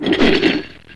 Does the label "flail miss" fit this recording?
Yes